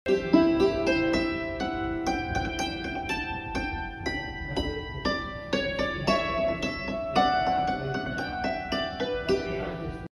Appalachian dulcimer

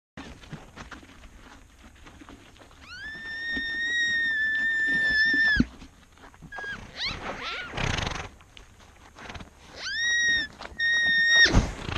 Saola; شاخ دوکی

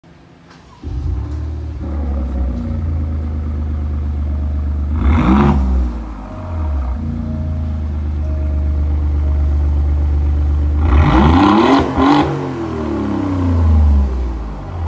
Alfa Romeo exhaust

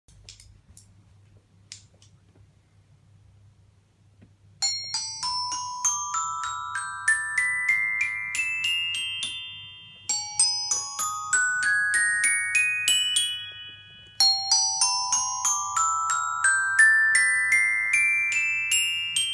Metallophone

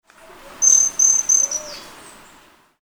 Ochraceous piculet

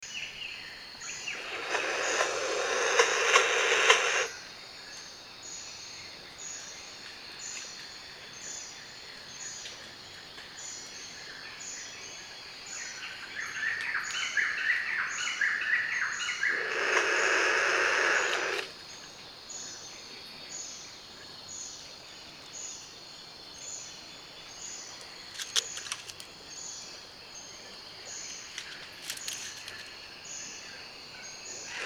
Kagu; پرنده کاگو

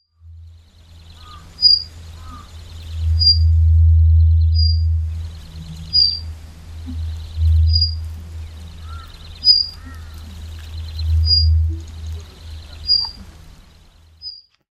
Jerdon's bush chat

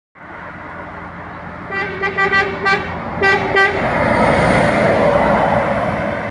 Volvo 500 horn; ولوو ۵۰۰

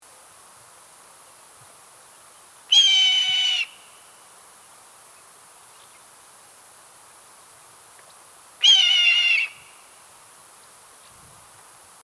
red tailed hawk; عقاب دم قرمز

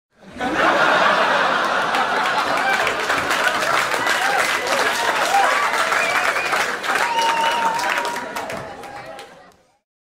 people clapping and laughing; کف زدن و خندیدن مردم

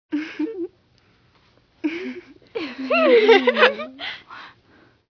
Laughing Girl

